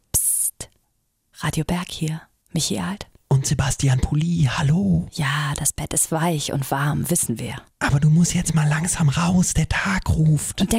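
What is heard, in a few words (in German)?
motivierender Wecker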